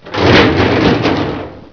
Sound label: trash